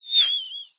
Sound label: lobby victory react flyby; smite; god artemis